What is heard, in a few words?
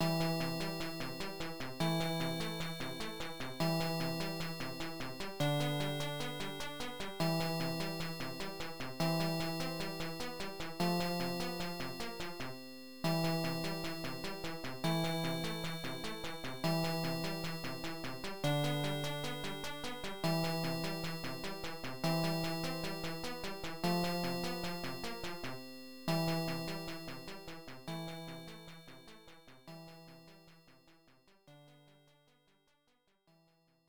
Level Completed; X-Rock; IBM PC AT